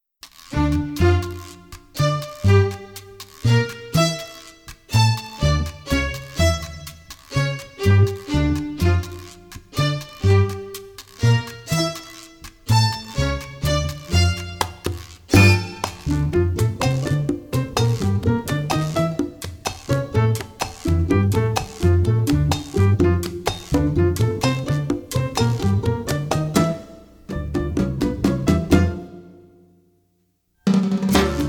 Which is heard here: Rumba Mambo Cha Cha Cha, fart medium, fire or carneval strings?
Rumba Mambo Cha Cha Cha